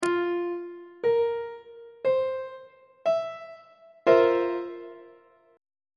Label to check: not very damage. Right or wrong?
Wrong